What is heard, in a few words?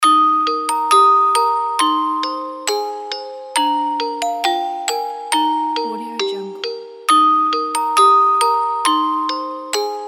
baby lullaby